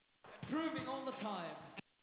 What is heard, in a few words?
grooving